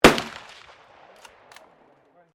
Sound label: RIFLE UNKNOWN FIRING BOLT ACTION